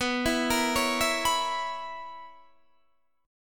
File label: B Major 7th Suspended 2nd Suspended 4th B down Guitar Open D